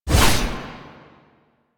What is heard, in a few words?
Blade Swipe; Weapons; Melee; Warframe; Dagath